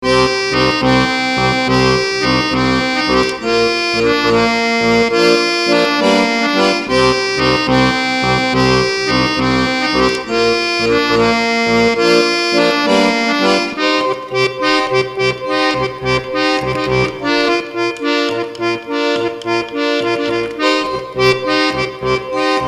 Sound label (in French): accordeon